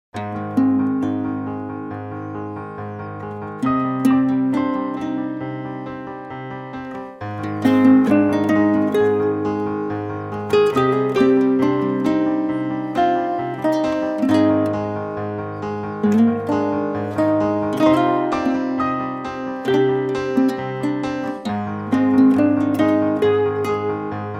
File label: PIANO NYLON